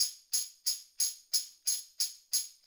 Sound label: Acoustic Percussion